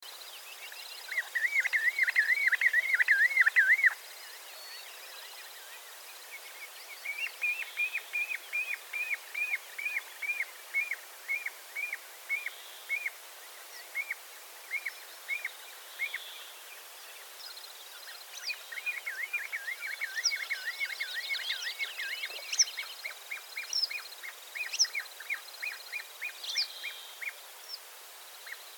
pluvier grand gravelot charadrius hiaticula

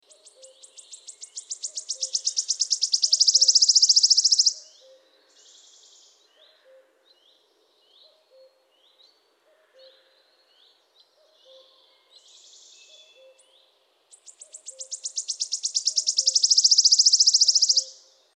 pouillot siffleur

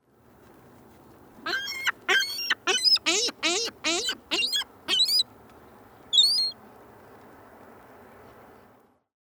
goeland a bec cercle

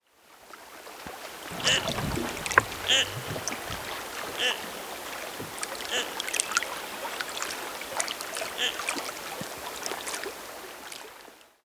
garrot a oeil d'or bucephala clangula

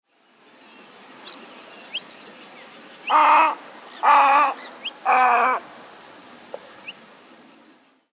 aigrette garzette, egretta garzetta

